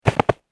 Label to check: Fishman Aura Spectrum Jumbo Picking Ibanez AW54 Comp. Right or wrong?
Wrong